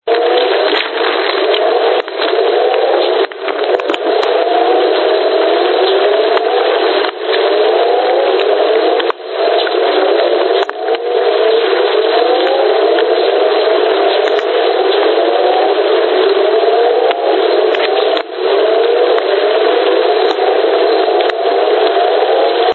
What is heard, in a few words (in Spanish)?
Coro ranas lloviendo; Naha